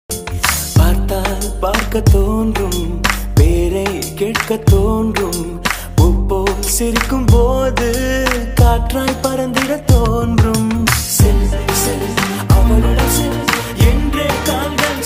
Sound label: song bgm, tamil bgm; paiya